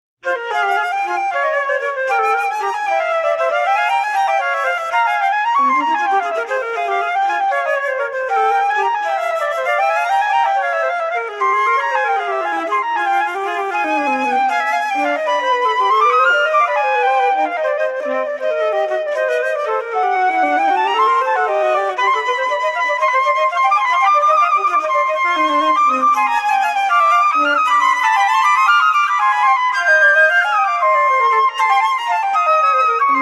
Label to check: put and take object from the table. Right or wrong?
Wrong